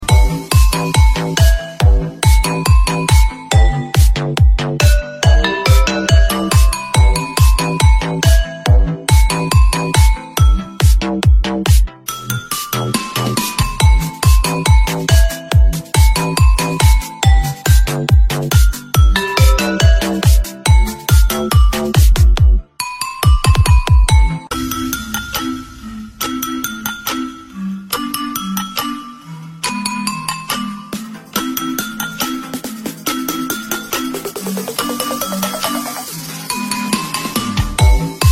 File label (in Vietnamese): nhac nen boc tham trung thuong remix xo so